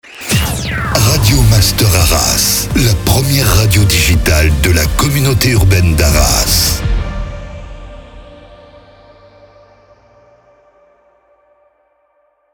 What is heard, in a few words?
Jingle SLOW; Radio Master Arras; Habillage